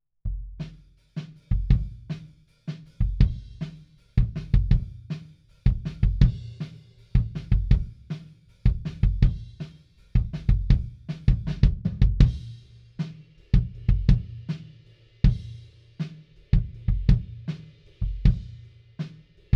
Kick In, 160 BPM

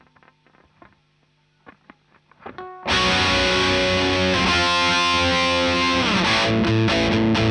Gtr; Marshal

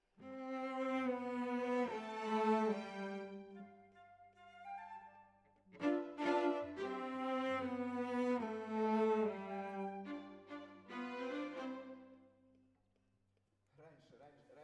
Cellos

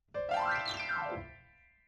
Piano, RNR for piano

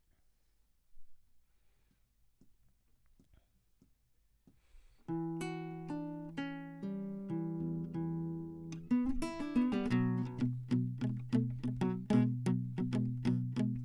Classic Guitar

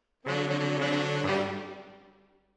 MKH AB, piano

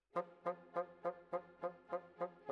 Bassoon, Jazz for piano

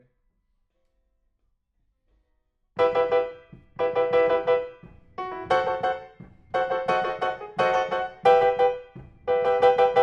PIANO

